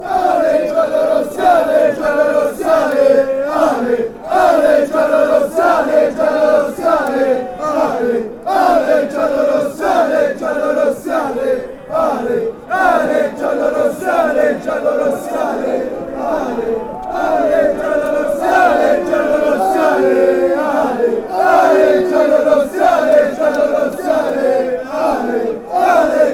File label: yellow reds, fan chants